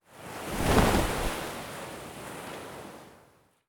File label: fishboat movement; ships siege